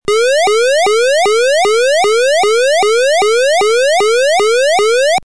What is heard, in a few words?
Siren; signals